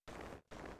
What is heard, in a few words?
steps snow; Rapid Gun; ipsc